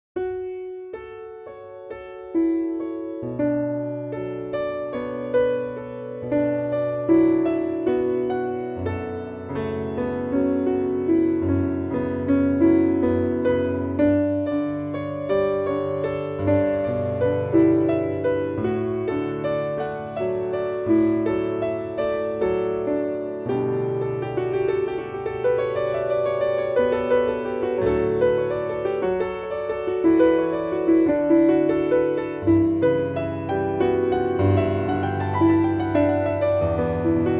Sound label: Piano cover